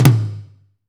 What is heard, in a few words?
TOM, Drumscapes Roland